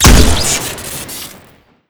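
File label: pressure grenade launcher shoot crit; tf2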